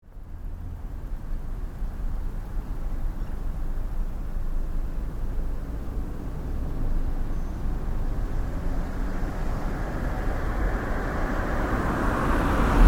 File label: car streets at night